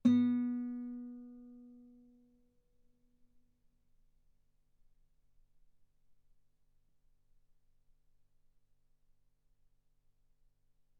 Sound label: Guitar ff sul B B3